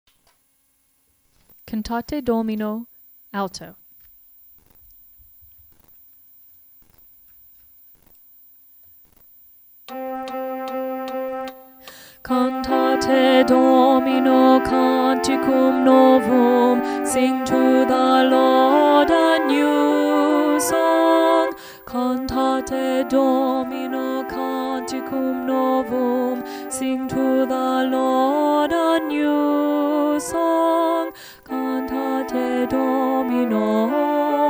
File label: cantate domino alto